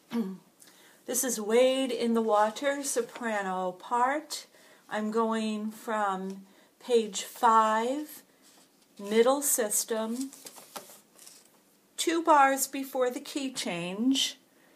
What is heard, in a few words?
Soprano; Special Chorus